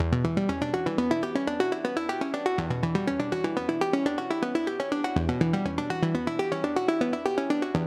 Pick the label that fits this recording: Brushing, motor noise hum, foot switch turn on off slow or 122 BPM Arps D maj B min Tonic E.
122 BPM Arps D maj B min Tonic E